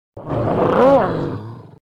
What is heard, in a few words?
dog